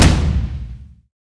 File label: drum; smite; god raijin